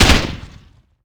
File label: explosion; Rust